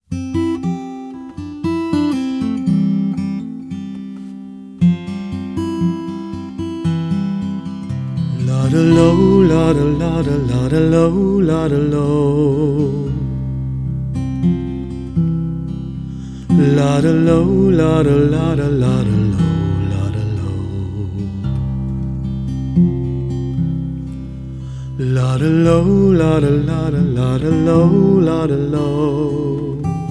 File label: - Male